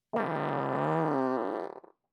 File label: Human, Fart; Raconteur Sound; Odor Free Farts Library Vol. 1